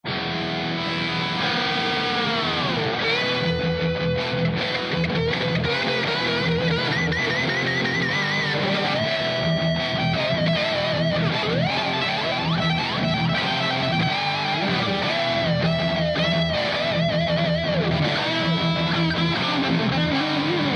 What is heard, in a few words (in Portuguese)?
solo doido